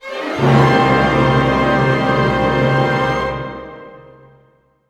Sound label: GLIS C MIN, gliss minor, orchestra; Sound On Sound - Peter Siedleczek's Orchestra